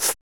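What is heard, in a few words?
vox percussion, Vocal Planet Jazz FX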